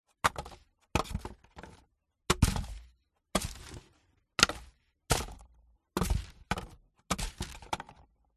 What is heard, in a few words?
dropping small box many times on the table; Sound Ex Machina